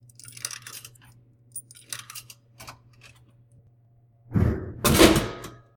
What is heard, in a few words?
vending machine